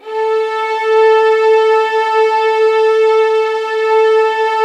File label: A4, soft violins; Q Up Arts; Denny Jaeger Master Violin Library